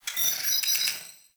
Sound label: Side Pillar Rand, Small Cargo Room